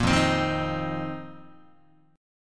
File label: F 7th Suspended 4th F down Guitar; strummed